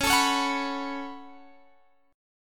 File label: C sharp 7th Suspended 4th Sharp 5th C sharp, Guitar Open G